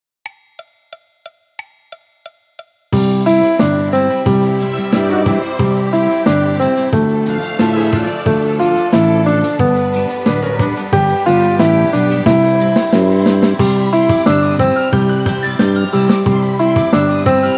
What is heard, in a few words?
Jingle Bells Piano Orch; جینگل-بلز